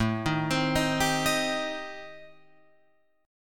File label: A-Minor 7th Flat 5th, Guitar Open F, chord; arpeggiated; JGuitar